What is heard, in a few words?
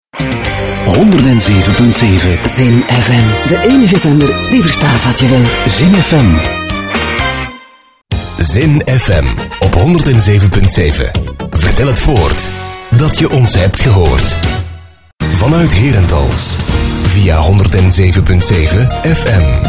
FM jingles; ZIN